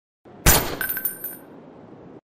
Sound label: FAMAS; اسلحه